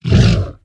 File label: Large Monster Grunt Hit